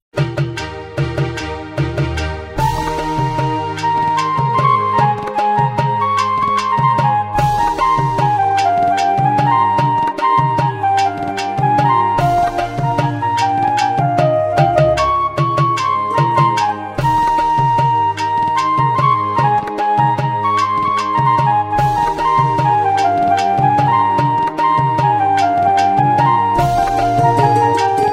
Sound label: Garba